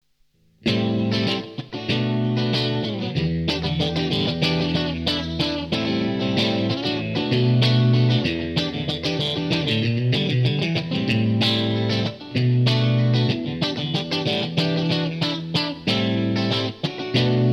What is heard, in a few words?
Maxon PAC9